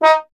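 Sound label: bass trombone D#; Miroslav Vitous; Solo Instruments VOL-1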